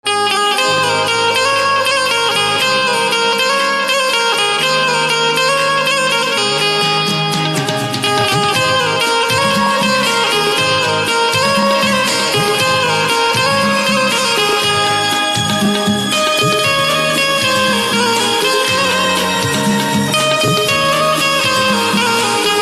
Banjo Instrumental Ringtone

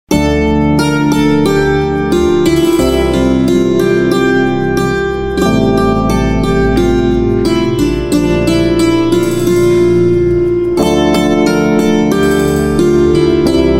Santoor

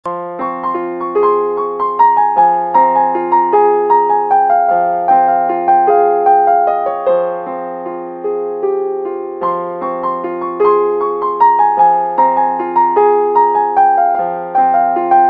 Piano Instrumental Ringtone

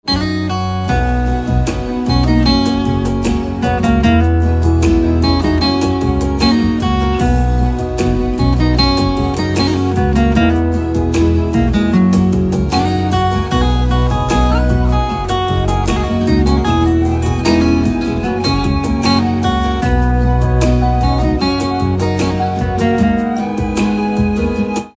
Guitar Instrumental Ringtone

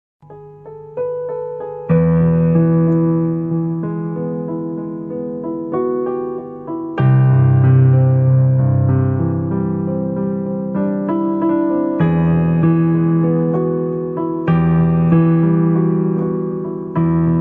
silent piano Instrumental Ringtone

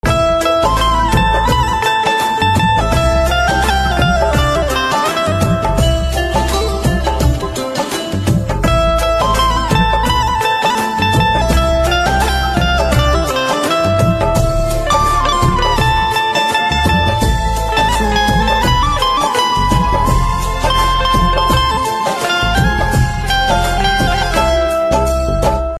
Banjo Instrumental Ringtone

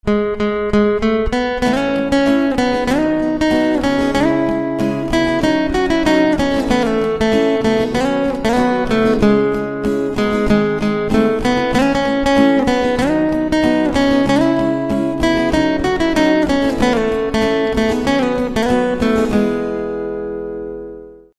Guitar Instrumental Ringtone

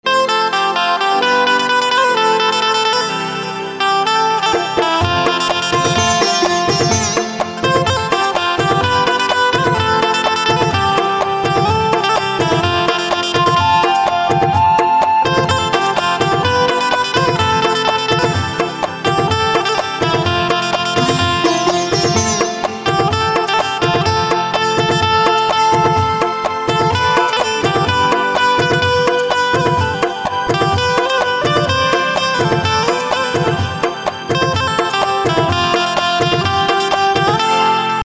Banjo Instrumental Ringtone

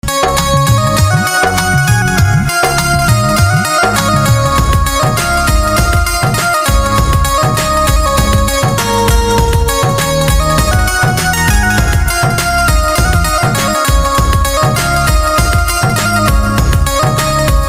Banjo Ringtone, bhojpuri instrumental ringtone

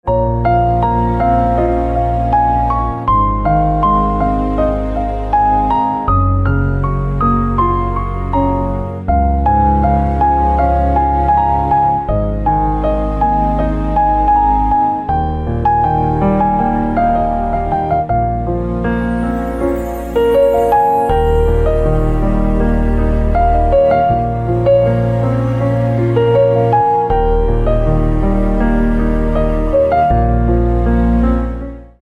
Piano Ringtone

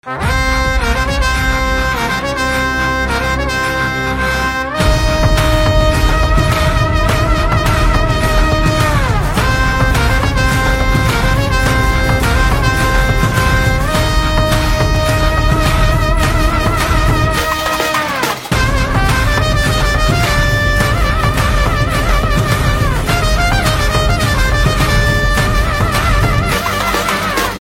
BGM, saxophone ringtone; Pushpa 2

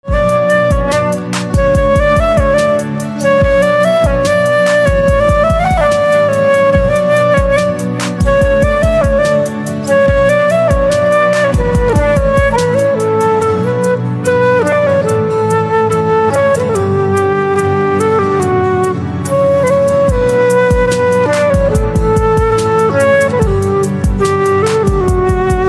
Flute Ringtone

